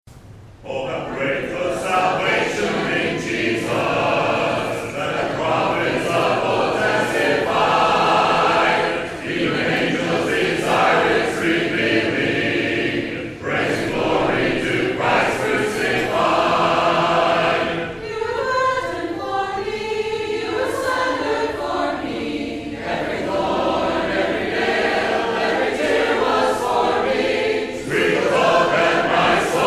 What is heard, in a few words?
a capella hymn